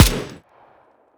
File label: negev clean; weapons; Counter Strike Model Pack; CS2